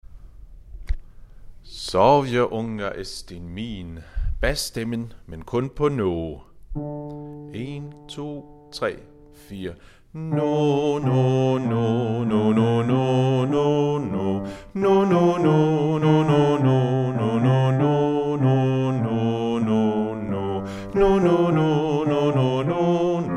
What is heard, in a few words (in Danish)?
basstemmen; Sov min lille; harlev koret